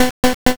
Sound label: picoeat